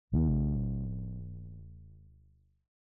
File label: Sirens